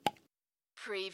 Pop open plastic lid from spray can

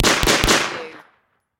Submachine Gun MP40

